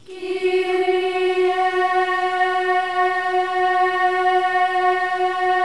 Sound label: KYRIE, Symphony of Voices